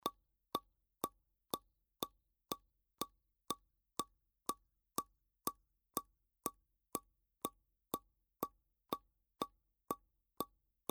Bouchon

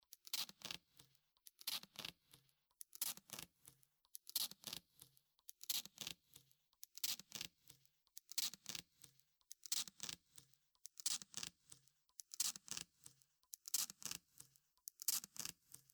Scotch

